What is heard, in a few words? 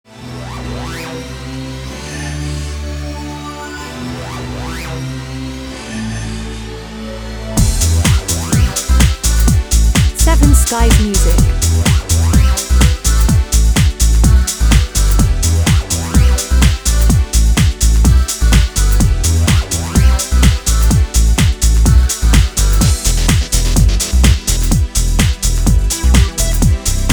String